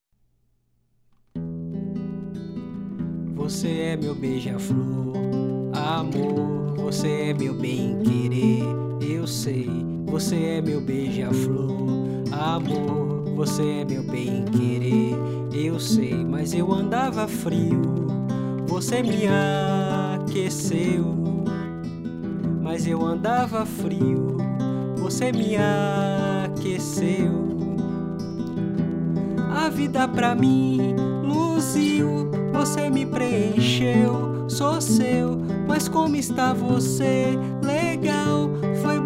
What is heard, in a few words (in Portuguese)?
envelope bass amor